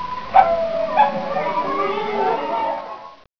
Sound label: WOLVES